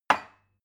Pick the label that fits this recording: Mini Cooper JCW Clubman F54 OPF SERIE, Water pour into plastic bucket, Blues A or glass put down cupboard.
glass put down cupboard